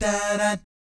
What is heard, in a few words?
"DAH DAT", vocal jazz fx